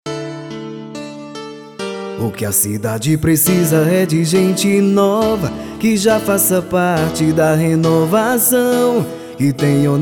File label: JINGLE, Emocionante, MIX; ZÉ FILHO